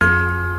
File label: burn, Bells; Notes